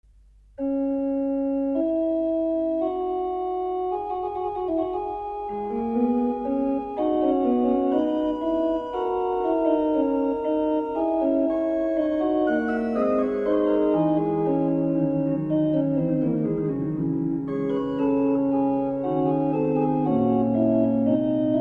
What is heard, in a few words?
Mass for Organ, Bach Organ; The Baptism-Christ, unser Herr, zum Jordan